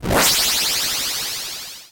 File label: die; Super Hexagon